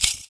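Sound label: PERC, percussions; Univers Sons - Raricussions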